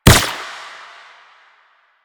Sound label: Gunbrella Bullet Deflect, Weapons